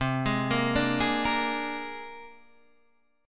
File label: C 7th Suspended 2nd Sharp 5th C Guitar Open D